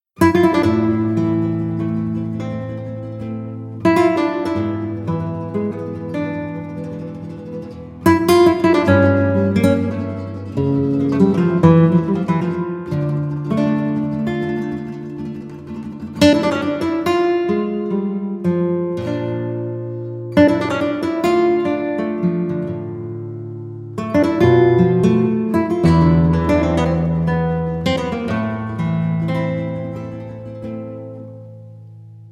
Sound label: sad guitar music